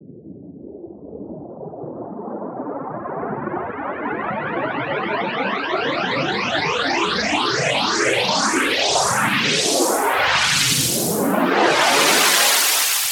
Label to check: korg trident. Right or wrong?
Wrong